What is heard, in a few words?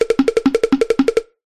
cartoon run scramble drum percussive